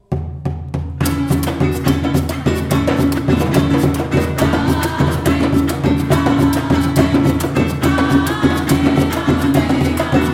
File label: amen